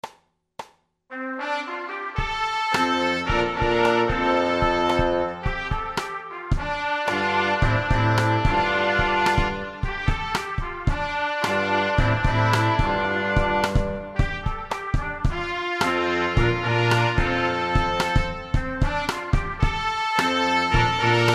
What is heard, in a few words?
ensemble